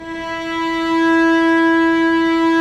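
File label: cellos, mezzo forte, ambience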